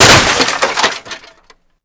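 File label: destruction photocopier plastic debris; Explosions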